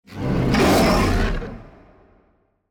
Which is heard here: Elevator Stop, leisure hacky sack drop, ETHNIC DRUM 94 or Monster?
Monster